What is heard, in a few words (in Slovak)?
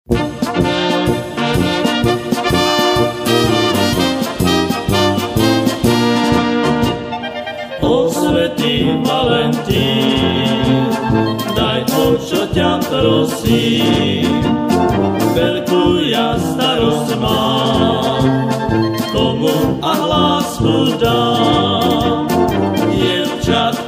polka; valentinska